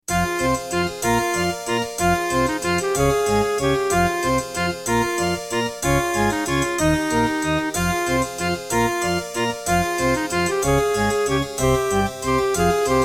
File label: Track 6, Terratec MiniWaveSystem WT64; Death Gate